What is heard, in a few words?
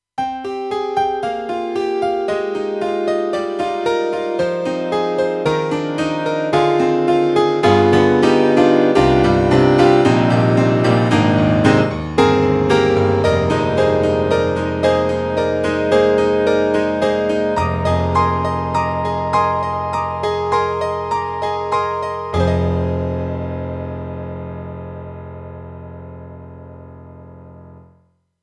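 YAMAHA TG300 Acoustic Piano 2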